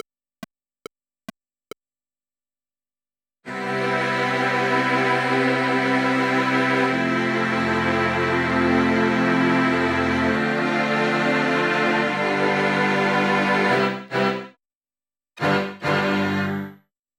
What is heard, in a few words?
Strings